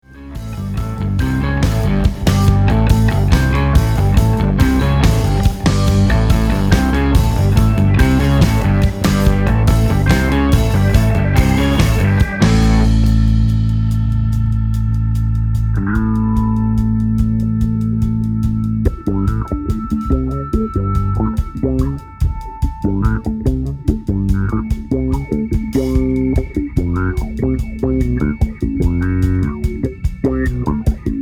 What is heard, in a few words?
Bass Solo